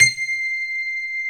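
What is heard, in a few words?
grand piano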